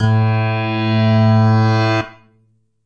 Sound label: Moo Piano A2, moo backwards piano